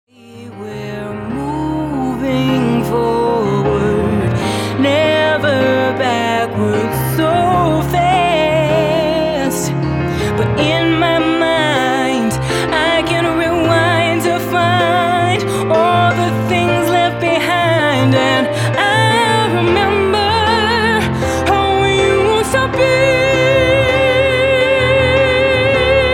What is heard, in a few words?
Piano